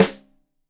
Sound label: Rim Shot Stereo, drums